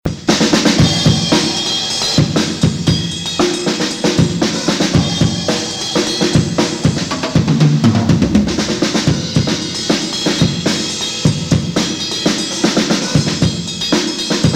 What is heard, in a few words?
Drum Solo; Ramadan; Proberaum